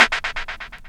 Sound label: FX HIT, Techno Trance; Ueberschall